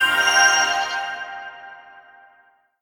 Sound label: UI In Game Fight Win; Faery Legends of Avalon